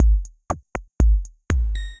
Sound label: Arp2600, Drums; Ableton; DM ARP 2600 Drums by Flatpack